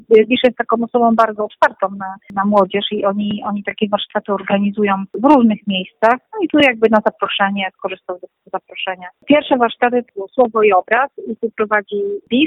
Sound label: hiphop